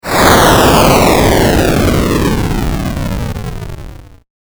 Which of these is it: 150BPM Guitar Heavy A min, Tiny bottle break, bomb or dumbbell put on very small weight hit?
bomb